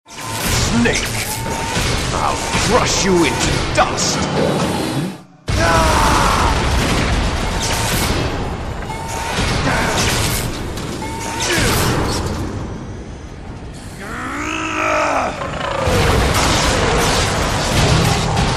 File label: destroying metal gear; MGS Samples